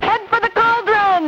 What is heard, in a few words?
Cauldron